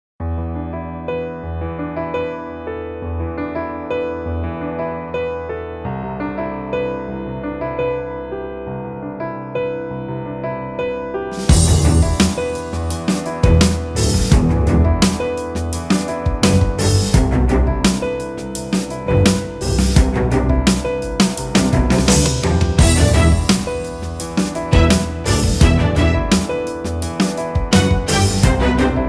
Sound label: Tension Medium